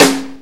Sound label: Acoustic Rim; EMU Xtreme-Lead-1